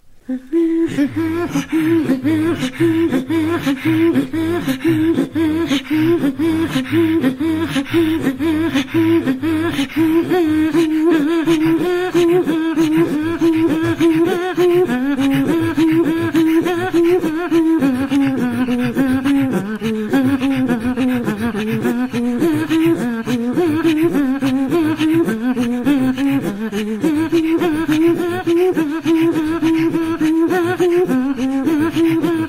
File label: Sound of the Wind